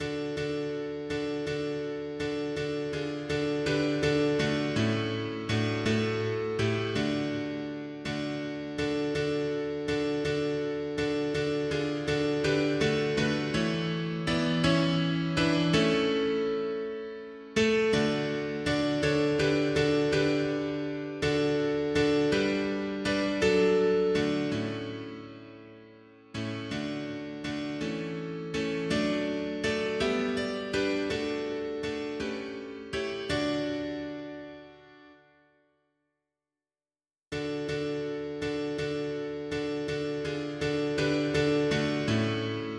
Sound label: mono piano; hymns; Himnario grande